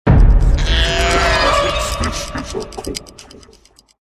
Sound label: goat